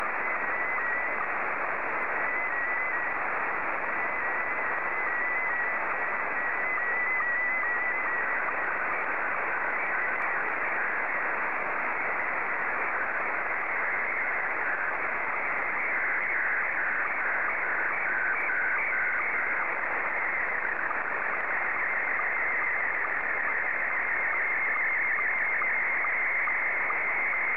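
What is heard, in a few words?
Scottie 2, slow-scan television; JL1FQZ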